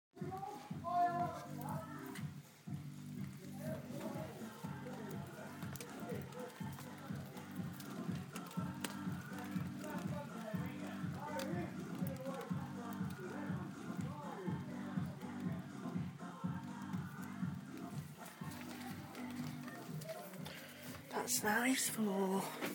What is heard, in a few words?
noisy neighbours